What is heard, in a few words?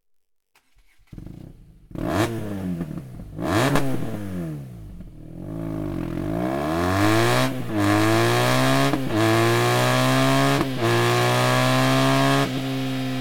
akrapovic slip on exhaust bmw r nine t scrambler